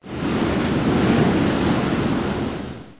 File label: wheat; Lords2